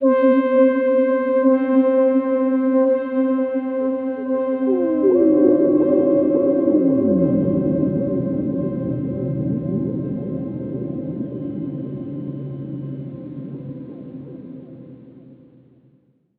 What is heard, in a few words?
LEAD Asteroid Tails (C), One Shots; Cymatics; Mystery Pack Vol 4